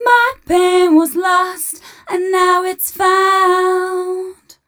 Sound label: f 132 mel, Vocals, fem